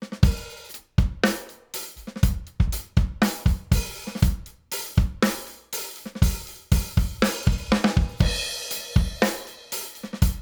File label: ONE LA 500 Drums Medium Limiter; IGS Audio